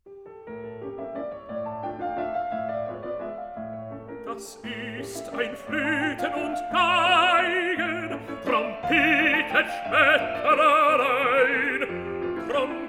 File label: song cycle for voice & piano; Dichterliebe; Schumann